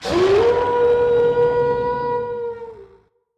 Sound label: scatter Landed; the wolfs night